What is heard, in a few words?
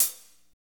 HAT hi-hat, Slow Shuffle Hi-Hats; Northstar; Drumscapes Roland